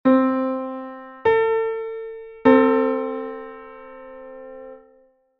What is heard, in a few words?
0-933,33 major 6th, minor 7th (9-EDO)